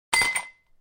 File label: ice down